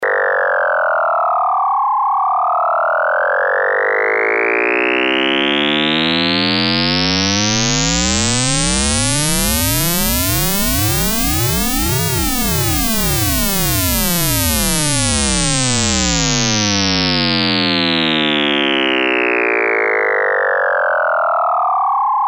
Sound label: audio square VC gain; Quad lfo